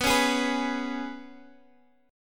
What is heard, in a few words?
Ab Minor Double Flat 5th